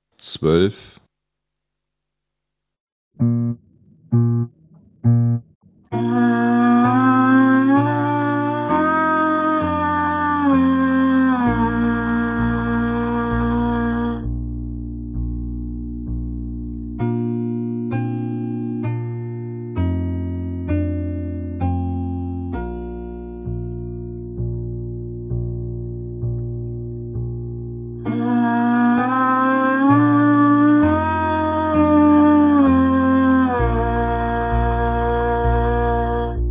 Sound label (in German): Klang, Hornschule